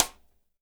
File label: SIDE STICK, STICKS